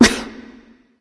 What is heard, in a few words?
tongue; smite; ratatoskr